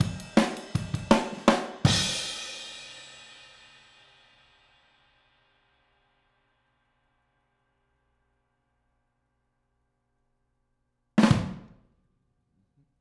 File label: Outro Overhead ST, 162 BPM, Drums; Drums from the Big Room The Mixes; Take Your Toms Off